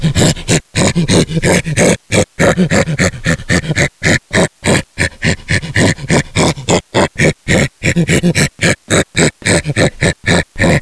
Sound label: breathe loop, zombie poison; hl2